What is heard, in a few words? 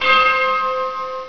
BELL